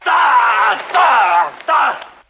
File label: dah dah dah